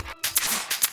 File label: chip LOOP hihat